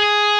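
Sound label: DISTORTED SYNTH BASS G#4; AKAI S-Series CD-ROM Sound Library VOL-7; STD SYN BASS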